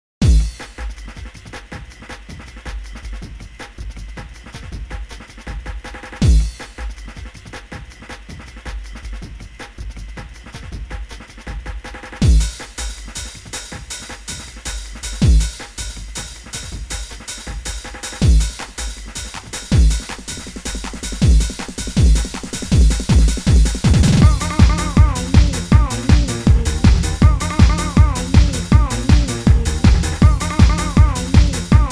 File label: Happy Hardcore Techno Trance Acid Rave